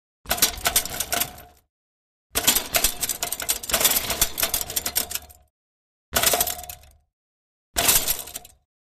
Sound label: Bicycle Rattles Chai